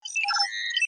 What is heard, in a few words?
computer beep; Trek